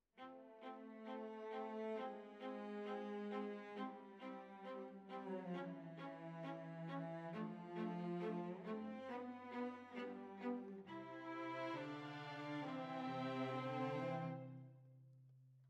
Violas, jazz for piano

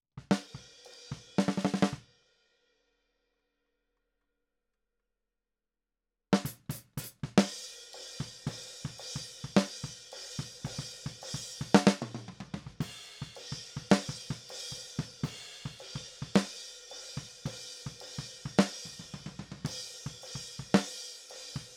Snare

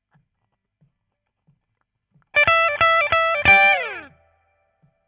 Guit Solo, RNR for piano

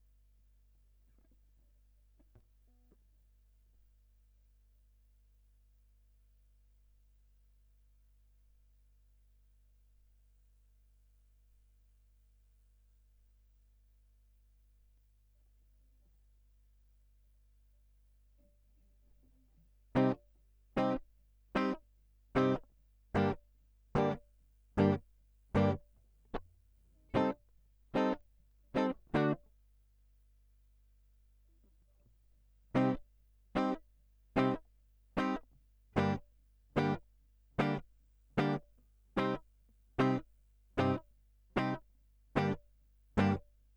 Gtr Line

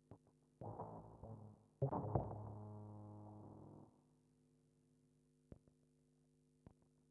Bass DI

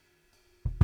BD Out

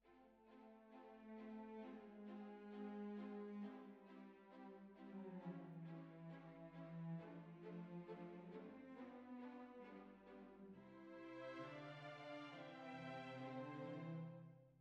Bassoon, Jazz for piano

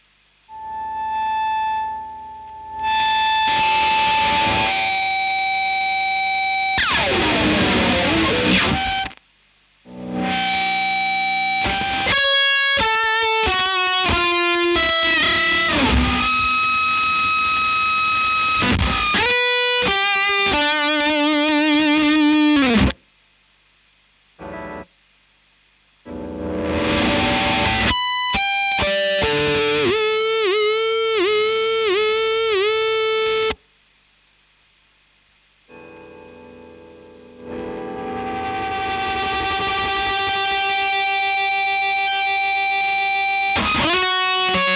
Gtr Ad Libs; Marshal

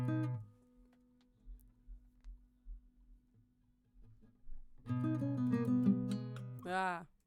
GTR

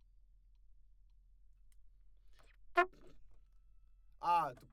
Trumpet

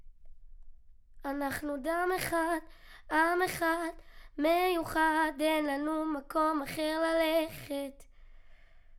Children Choir; Studio Bekol Children Choir

